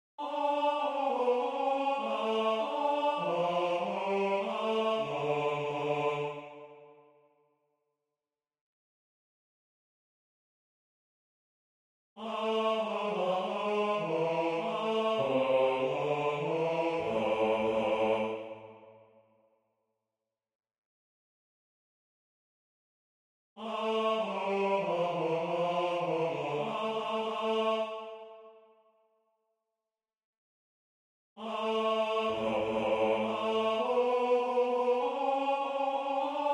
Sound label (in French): MAGN Basse; Durante; Magnificat